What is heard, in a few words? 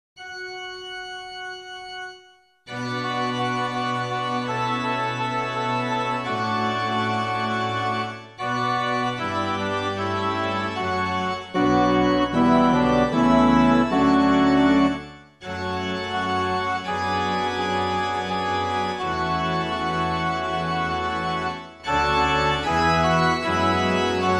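penitential act form 2 english midi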